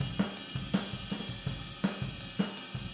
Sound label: Boogaloo Chorus Overhead ST, 82 BPM